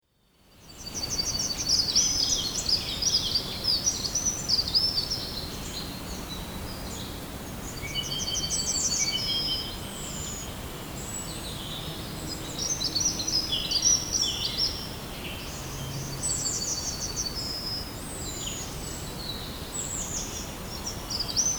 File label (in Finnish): keltasirkku, linnut